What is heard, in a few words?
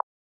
Keypress Standard; Essential PH-1